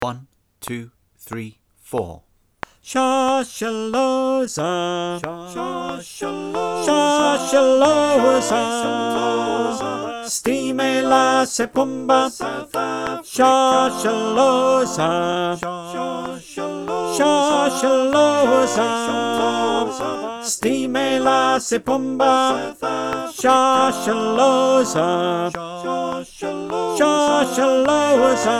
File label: Call click; Shosholoza